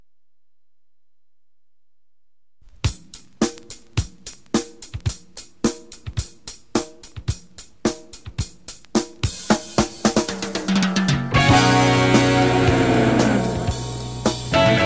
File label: break